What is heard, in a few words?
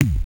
Kick, Genesis; ToeJam & Earl 2